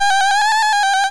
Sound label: weird; raylib